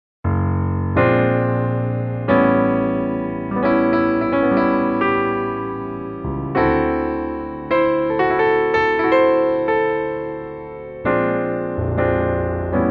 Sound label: Family Blues